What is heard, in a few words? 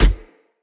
Cannon Tesla Shot Punch; Weapons; Player